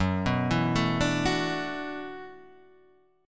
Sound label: E 7th Suspended 2nd Suspended 4th, Gb, chord